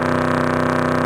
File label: hotrod loop high, vehicles; warthog